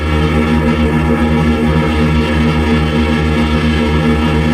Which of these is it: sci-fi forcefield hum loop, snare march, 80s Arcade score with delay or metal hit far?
sci-fi forcefield hum loop